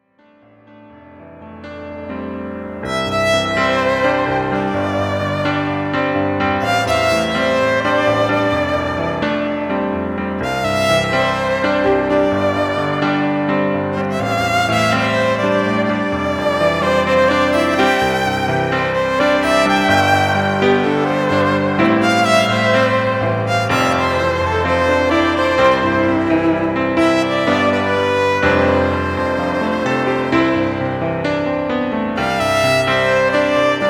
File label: Keys and Violin; Cocktail and Dinner Music